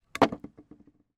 impact wood panel drop on ground

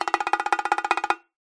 cartoon run fast bongos; zapsplat

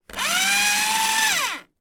office paper shredder shreds document

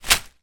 impact small branch leaves fall hit ground; zapsplat

